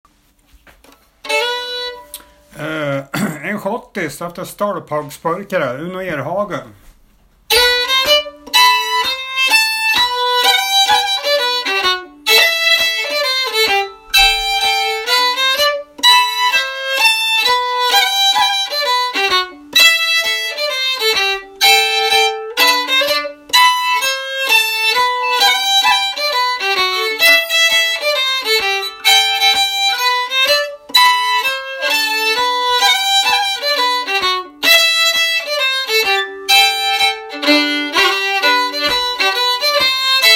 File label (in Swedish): Schottis; efter Uno Erhage Stölphagspöjkera; solokonserten i Vallentuna